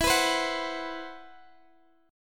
C Major Sharp 11th E

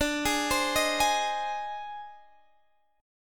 Ab Major, D, guitar chord; arpeggiated; Ab/D; JGuitar

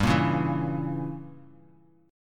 G Diminished 7th, Bass Standard; strummed; JGuitar

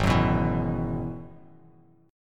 Ab Minor 7th Sharp 5th Ab, down, Bass Standard; strummed; JGuitar

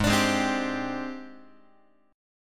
Ab Major Sharp 11th Ab down Guitar; AbM#11 strummed; x,4,5,6,4,6

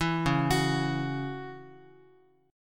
C sharp Minor Double Flat 5th, Guitar; arpeggiated; 7,9,9,x,x,x; 4A,4E,5A,5D,5G#,6C#

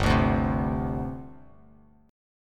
G Suspended 4th, Bass Standard; strummed; 3,5,0,5; JGuitar

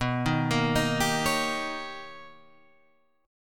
D sharp 7th B, Guitar; D#7/B Chord {7 6 8 8 8 9} arpeggiated

